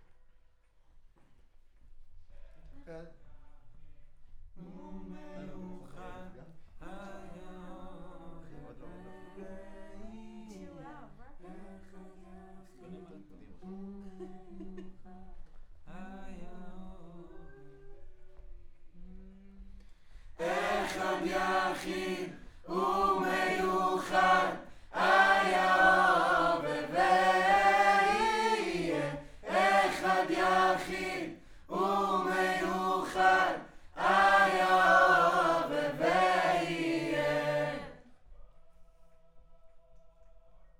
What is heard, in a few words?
Choir